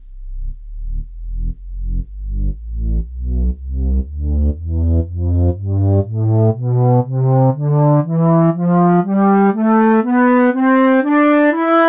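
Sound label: flute scale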